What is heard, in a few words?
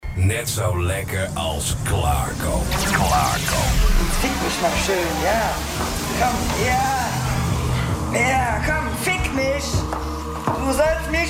Jingle; Audio Fragmenten; Arkan Radio 2